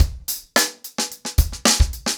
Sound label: 110BPM, acoustic drums; The Roots Drumloops